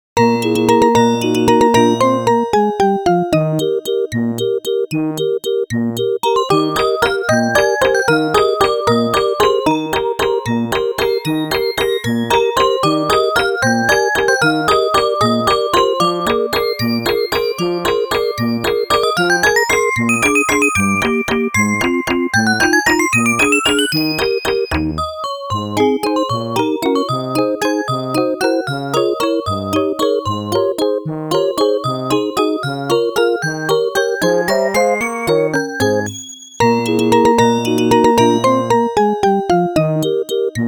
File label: Waltz; Namco Arcade Sound Digital Collection Vol. 1